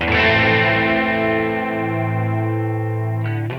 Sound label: guitar strum Am; blindmime; atticus